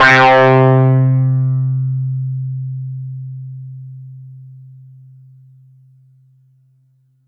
Yamaha FS1r Hyper Fuzz C5

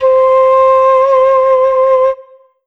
Alesis Fusion Shakuhachi C5

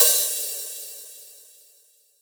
Open Hi Hat

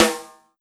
Yamaha RM50 Snare Drum

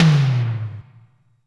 Electronic Tom

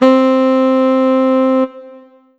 Yamaha EX5 Tenor Soft C4

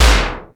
Ensoniq ESQ-1 Low Synth Tom

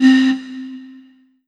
Korg 01W Bottles C4

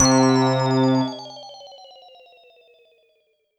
Alesis S4 Plus Rain FX C3

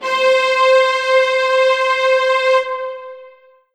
Alesis S4 Plus Arco Violas C5

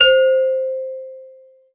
Casio CTK-611 Crystal C5

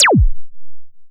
Cartoon Laser

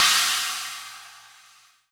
Kawai K11 Crash Cymbal

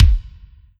Yamaha TG100 Bass Drum

